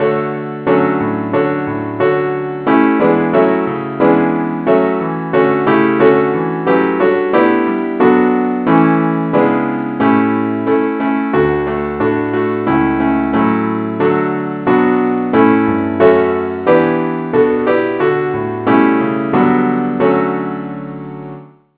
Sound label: keyboard key D; saintthomas